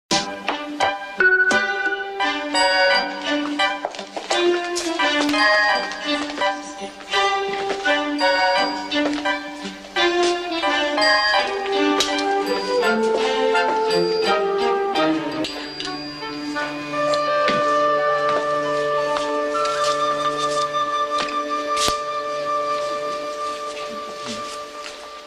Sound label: funny theme